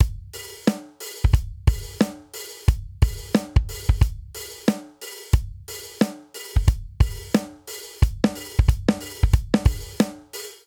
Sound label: Swing Hip Hop Chorus 90 bpm, Drum; 4 4; MIDI Clips; Ableton; Factory Packs; Drum Booth